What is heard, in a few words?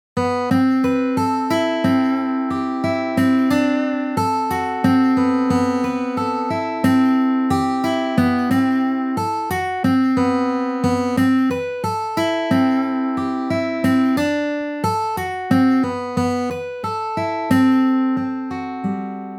riff